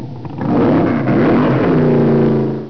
rattle; Deers Revenge

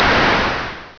launch; Color Strike Jets 3.0.2

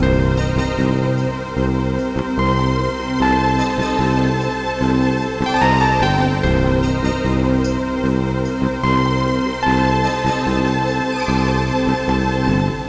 menu loop

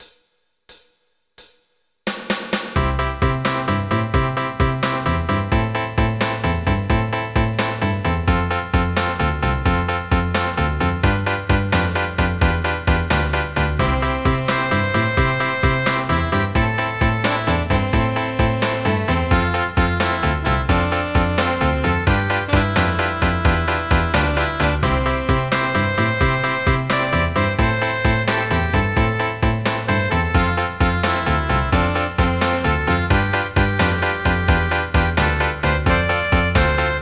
50s rock

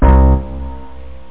zing string

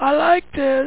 game won

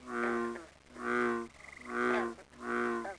bullfrog; DAoC; Toa

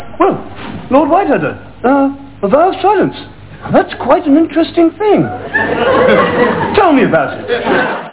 silence

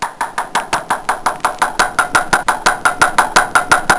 Footsteps

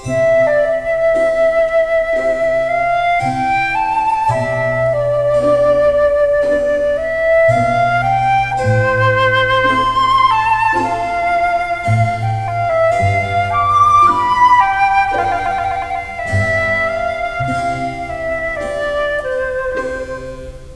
flute

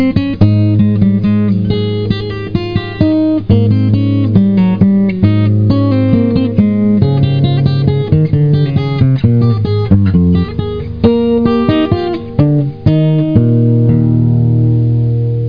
BOUREE; DR BRAIN; BUGS

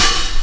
909 HIHA; BAS PERC

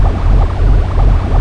acid amg; thexder

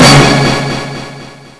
Bonus; The Crypt

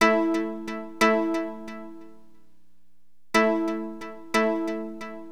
perky, keyboards; HipHop; LiveAct Pool 1

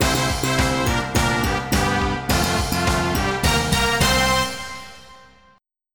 get funk

